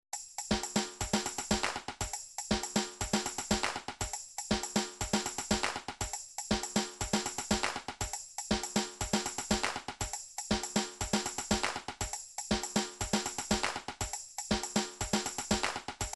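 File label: drums pattern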